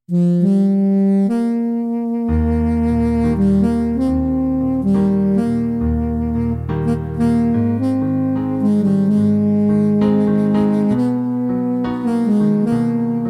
Sax and Piano Interlude Music

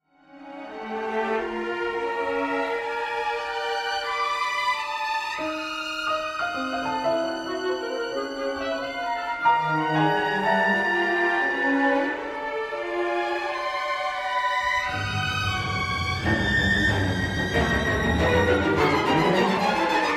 piano and orchestra

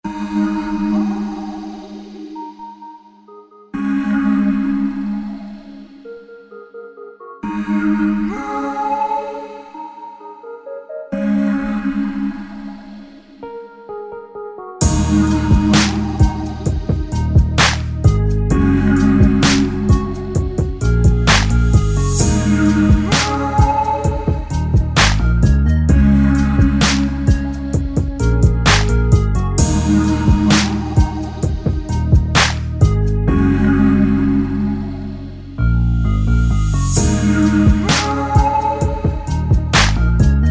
Ebm 130bpm

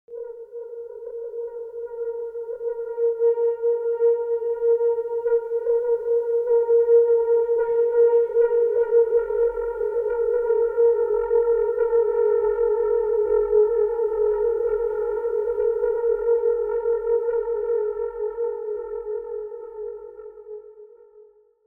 incoming storm atmos

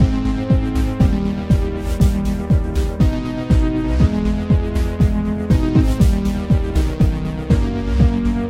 Funny Keys

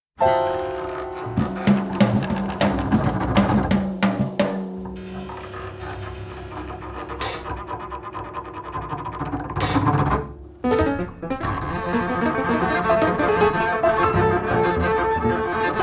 Piano Trio; Serranias Sketchbook for Piano Trio

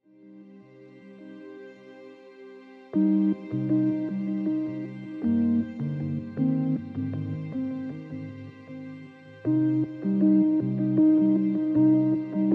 Electronic Folk

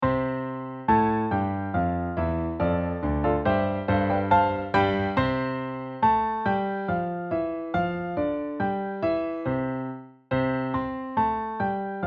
Piano

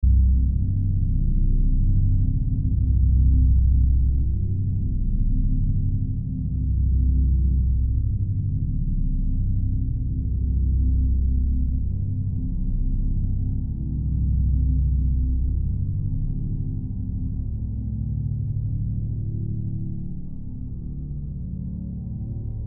36Hz

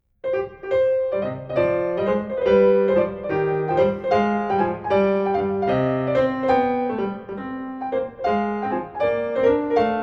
Gigue; J.S. Bach French Suite in C minor, BWV 813

